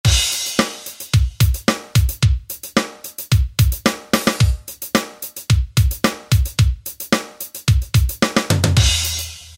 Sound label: Acoustic kit; E-mu PK-6